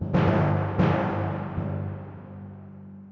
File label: Tymp